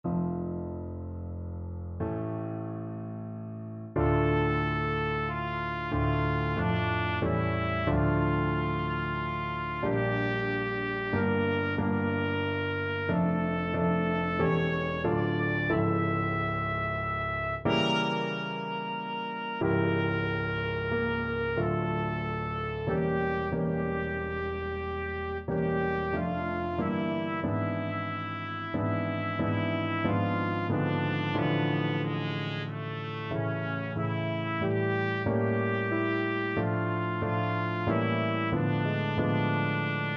beethoven pno sonata 29 Op 106 3rd mvt trumpet

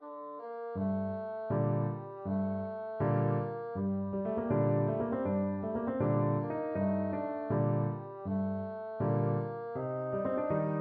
bassoon

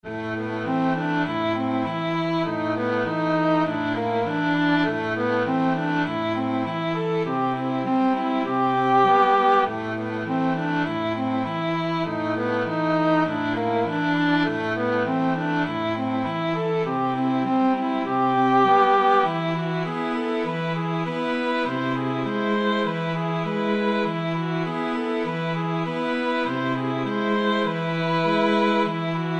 mountain king, 2 violins cello

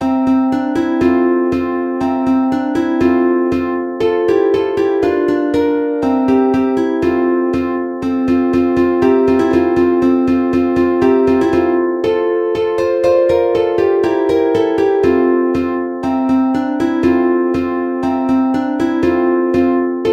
ukulele

